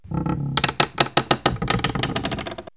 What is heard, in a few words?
Creaking Door; X-Static Goldmine